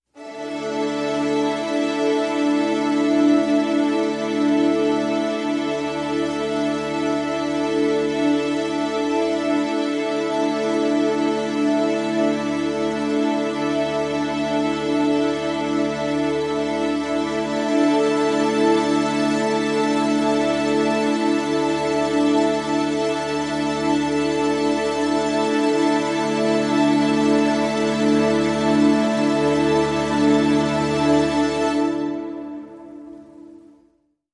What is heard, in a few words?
Collective Strings D